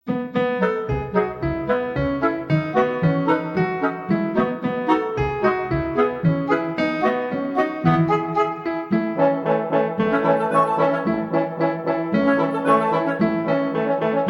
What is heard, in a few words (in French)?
Maman les petits bateaux Instrumental